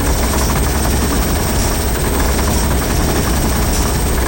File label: autogrinder shred; tf2